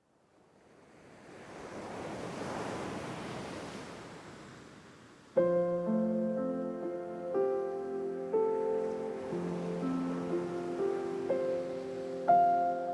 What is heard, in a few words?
piano